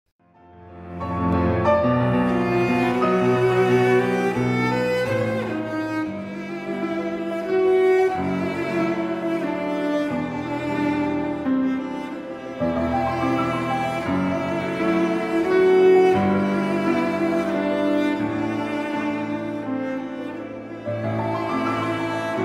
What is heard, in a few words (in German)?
cellosonate